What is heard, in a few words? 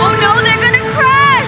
crash; Ranma